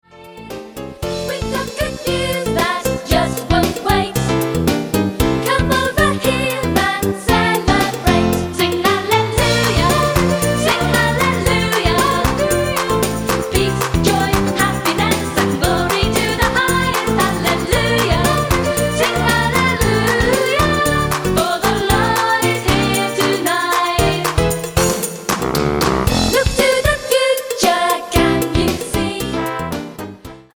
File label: sing hallelujah; christmas songbook